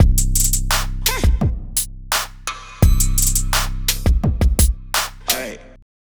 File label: HipHop Kit